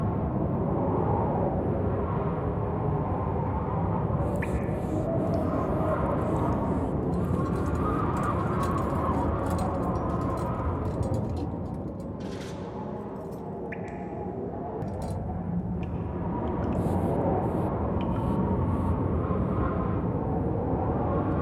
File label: Prison Ambience; Prison Ambience2